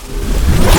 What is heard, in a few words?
laser charge; Mindustry